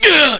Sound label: Hit; jin kazama